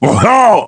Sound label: Damage Fly; SSBBrawl; Wario